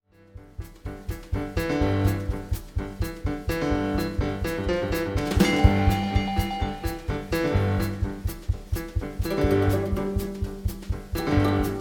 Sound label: jingle bells